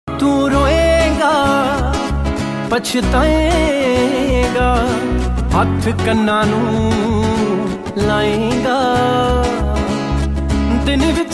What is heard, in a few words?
Sad Punjabi Song Ringtone